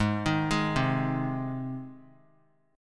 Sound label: G sharp Major G sharp, chord; arpeggiated; JGuitar